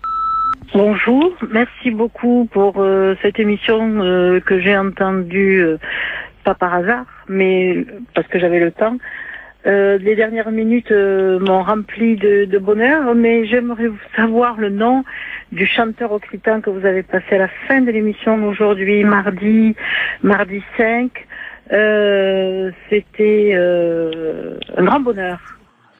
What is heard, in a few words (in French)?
Chanteur occitan repondeur